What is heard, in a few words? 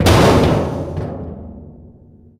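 panels impact large, metal; Portal 2; Source Engine; video games; physics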